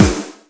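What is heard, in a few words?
snare drum POWER; Crypton; DrumBase5000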